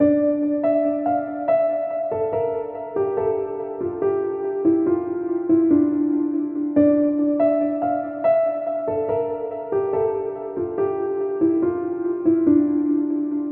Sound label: Drill Melodies Dmn 142bpm keys; Drippy